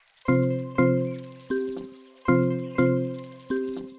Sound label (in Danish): ringetone